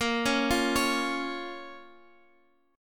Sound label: A sharp Minor, down, Guitar; 4F,4A,5C,5G,5C,6E; arpeggiated